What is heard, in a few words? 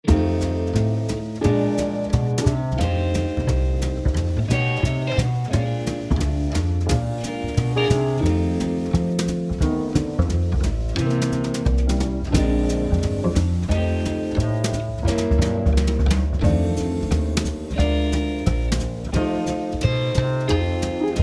Blues Jam